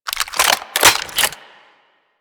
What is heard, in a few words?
Reload, Weapons; Kraken